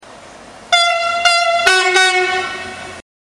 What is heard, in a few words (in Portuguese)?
Buzina; Comboios